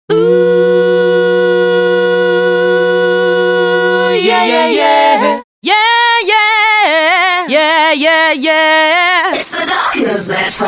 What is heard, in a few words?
female vocals; hexloops